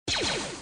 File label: blaster